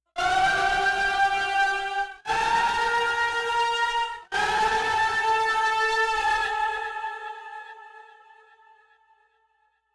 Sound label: Boss Fight Theme Loop Scream